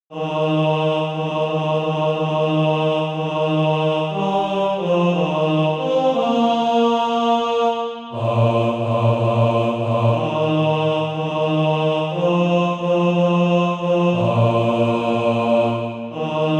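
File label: bass, Practise Music; Crown Him with Rejoicing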